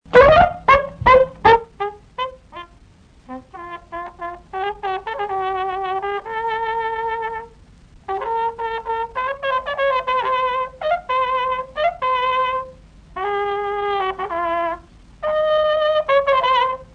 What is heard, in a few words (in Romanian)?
Hora batraneasca la trompeta; jocuri si cantece batranesti; Vaslui